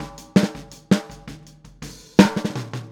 Boogaloo Fill Snare, 82 BPM, Drums; Sonic Foundry (Sony Creative Software) - Drums from the Big Room The Mixes

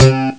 slap it; Amiga Soundtracker sample

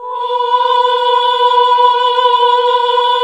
OHH B, Nuns Ooohh; Sound & Vision - Gigapack II CD 1 (Roland); HAL NUNS AAH OOH

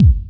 HARD KICK; Pro-Rec - Synthsonic; TRINITY

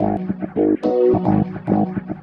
Funk Keys; Secretcircle; Sonic Foundry (Sony Creative Software); Synchro Funk

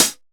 hat closed; Polyend; ARC Noise

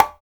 PWRCGA SLAP, congas; Drums In Your FACE!

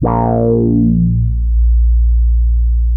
A#2, Boom da Bass; E-MU Producer Series Vol. 6 – Analogia Project (CD 1)

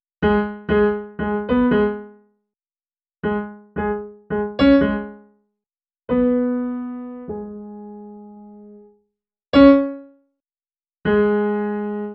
Piano, cinematic funk; Sonic Foundry (Sony Creative Software); Cinematic Funk Urban Tone Poems

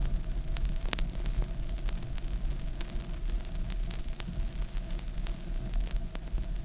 Vinyl, noise

